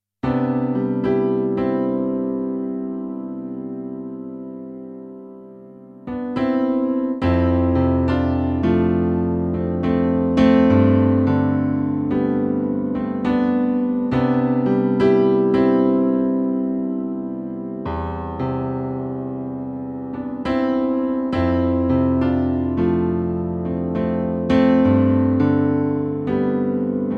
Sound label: improvisation